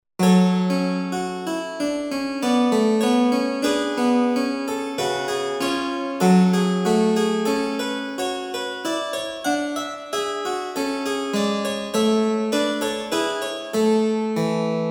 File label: Dutch Harpsichord, Trio; JS Bach